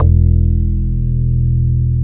WOOD BASS; Synclavier Sampler Library; KEYS AND GUITARS

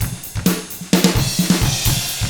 HARD ROCK, Drums

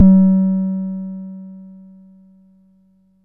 Long BD, Jomox Xbase-09

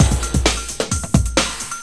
funky; Urban Shakedown

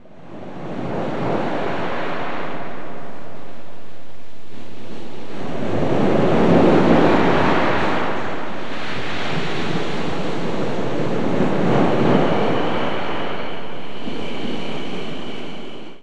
SEA, SEA-WATER; Best Service - XXL Production Effects

